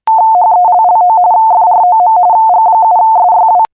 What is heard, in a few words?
rtty